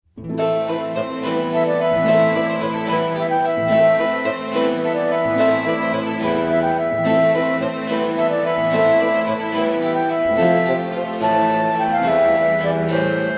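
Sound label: Campaign Music; heroes of might magic iii hd 2015 ios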